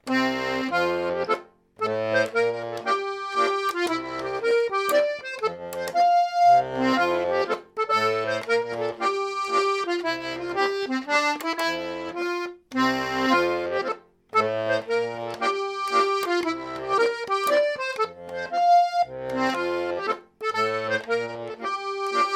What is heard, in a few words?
Sussex Mazurka